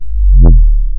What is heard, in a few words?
deep sweep, bass